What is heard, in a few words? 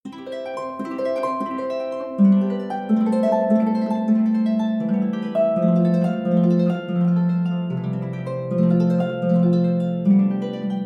ebony harp arpeggio